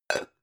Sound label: pan close chink soft